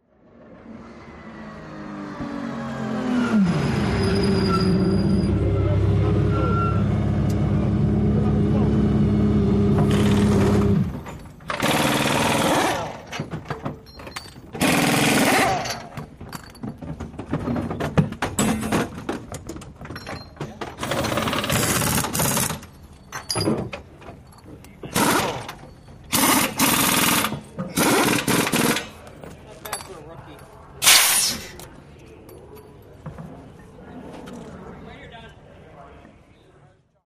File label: Sports Car Race Pit